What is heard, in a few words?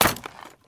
cardboard destroyed; hits